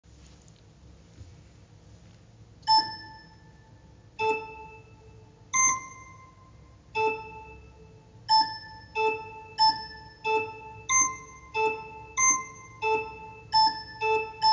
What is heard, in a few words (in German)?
Orgel